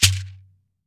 MARACA TONE; AKAI S-Series CD-ROM Sound Library VOL-5; SHAKER.MARAC

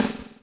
BUBL; Spectrasonics - Backbeat

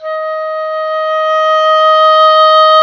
WND OBOE Dry

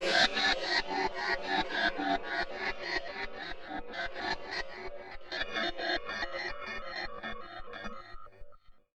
Ring Mod, Cymbal, contact mic; Murf & Ring Mod

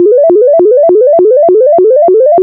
oscillator, VCS FX; Zero-G Ambient 1

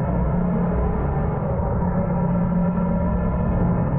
Tonal Re Draw, sparse soundscape

